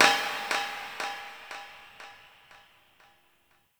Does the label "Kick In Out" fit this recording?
No